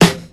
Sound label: dry snare one shot e key